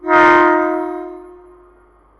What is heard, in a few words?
klaxon, Train; OpenBVE